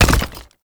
Mech Step Surface Rock; Warframe; Nechro Mech; Step Surfaces

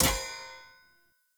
Short Sword Medium Impact Metal

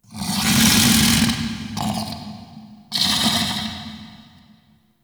Interior Burp Hiss; Ambience; Indoor; Warframe; Infested Eris

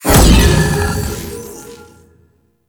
Bounce Deactivate; Trapper

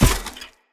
Step Vegetation, Footsteps; Characters; Enemy; Mendicant; MndKing

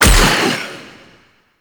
Shot Fire; Warframe; Noctua; Weapons

